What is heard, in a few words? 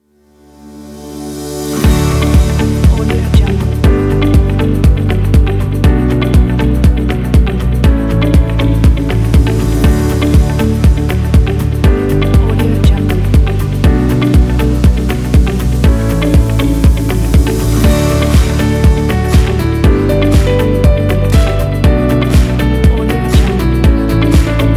happy corporate